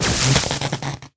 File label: Electric